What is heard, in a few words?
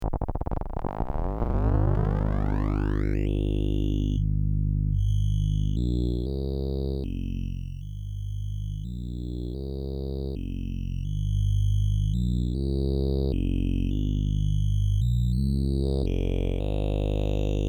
Filter FM Vocaloid